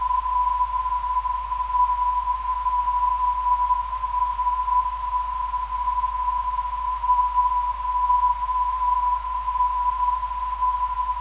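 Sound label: 330kHz, non-directional beacon; SKS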